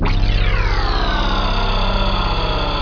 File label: roar; awe32